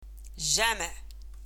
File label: mosque